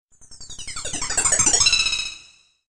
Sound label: Sms